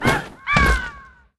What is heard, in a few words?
gryphon land